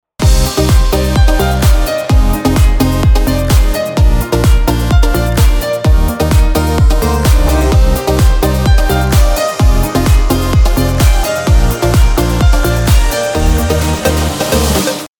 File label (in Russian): рингтон